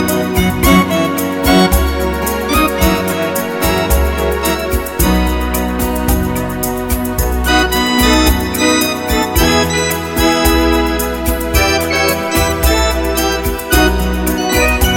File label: Pretty and Slow Bossa